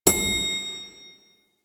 Proto Infested Hover Ring; Warframe; Brachiolyst; Abilities

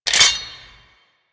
Cannon Mech; Grineer

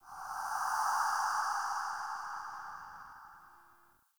Spirit Gust; Ambience; Dungeons; Under City

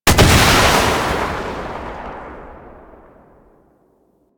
Rocket Launcher Shot; Weapons; DE; Warframe; Tenno; Lasrian

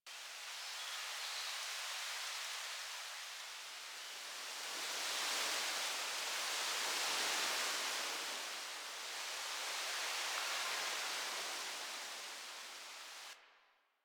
Fall Tree Wind Loop; Warframe; Ambience; Nineteen Ninety Nine; DE

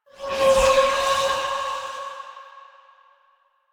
Generic Foley; Warframe; Choir

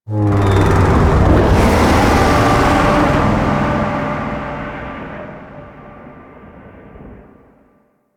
Void Dragon Vocal Distant Idle; Enemies; Vocals

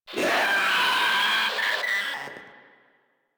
Proto Infested vocal attack; Warframe; InfestedNinetyNine

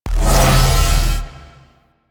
Fire Charge; Warframe; Cephalon Weave; Weave Boss

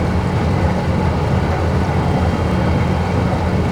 Machine Loop

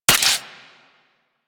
Garuda Crossbow Mech B; weapons

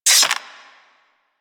Generic Bow Load Arrow; Warframe; Foley

